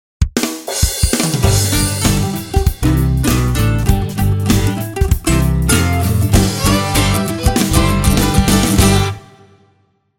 Picnic Down by the Creek STING

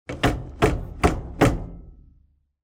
TELEVISION VINTAGE

